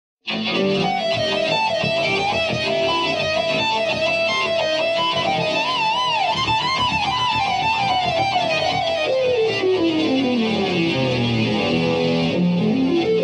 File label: GUITAR ONLY excerpt